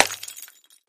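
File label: Bottle Small Crash, Housewares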